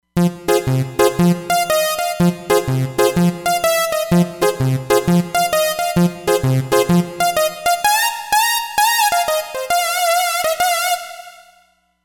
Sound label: Dual Saws